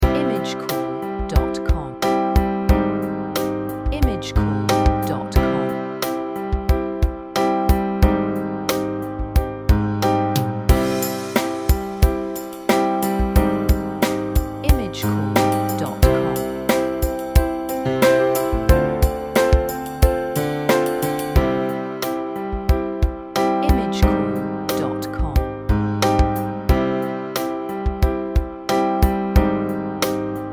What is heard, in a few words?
stock audio beat